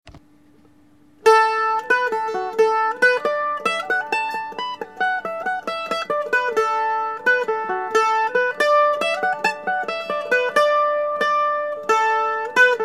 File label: Jig, mandolins; Graemsay